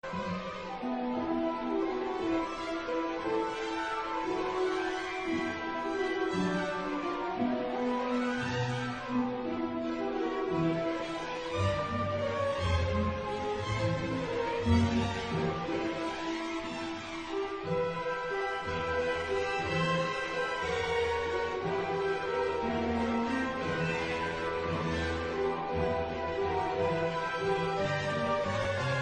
paino; harry potter

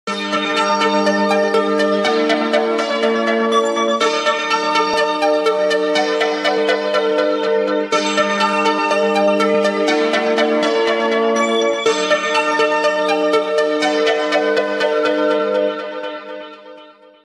Samsung Galaxy S25 Original

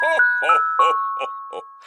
hohoho messenger

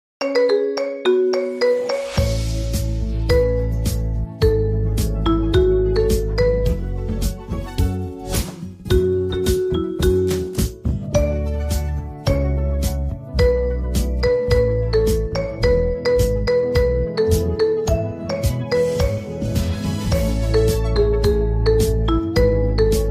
marimba

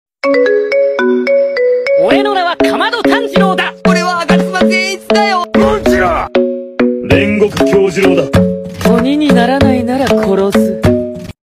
otaku marimba

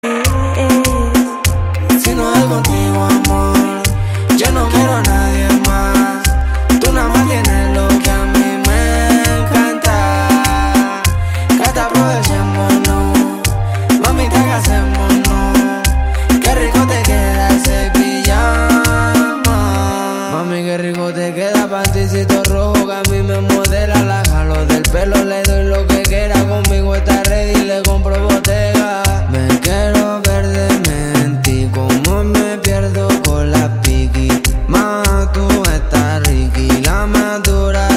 remix

